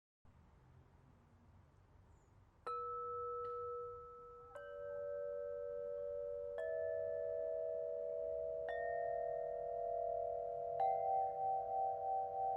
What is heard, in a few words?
Celtic moon B 432hz Crystal Harp; Harfa krysztalowa Celtycki Ksiezyc B